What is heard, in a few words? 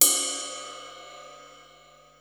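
cymbal ride mono; 300 Drum Machines; Yamaha Motif ES-Series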